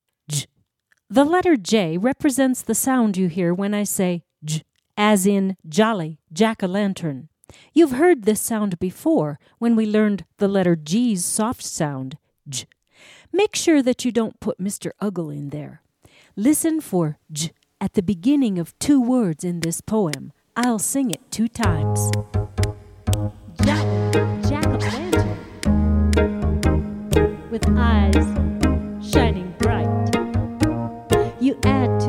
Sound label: Digital Scratch